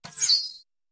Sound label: Arrow Whistle Bys, Medium; Arrow Zing By Close Up. - Archery; bow & arrow